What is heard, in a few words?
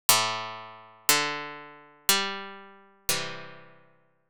test guitar